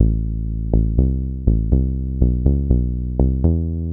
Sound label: 122 BPM Bass Synth C maj A min Tonic A; Pro Bass Synth Studio Kit; MMB